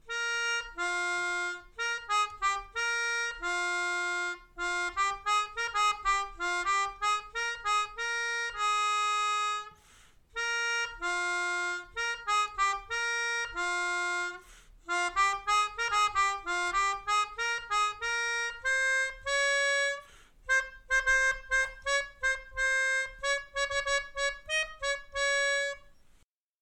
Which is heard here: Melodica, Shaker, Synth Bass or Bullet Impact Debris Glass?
Melodica